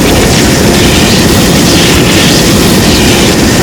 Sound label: afterburner loop, weapons; tf2; crit